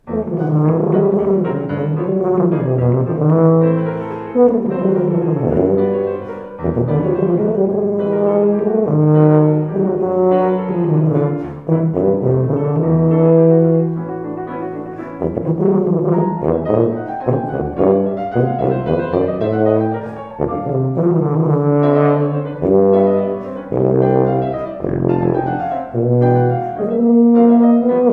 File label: Suite for Tuba and Piano; Suite No. 1 for Tuba and Piano; Effie Suite; Alec Wilder